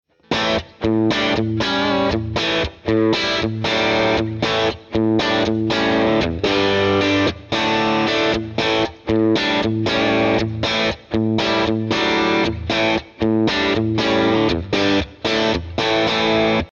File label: V30 Crunch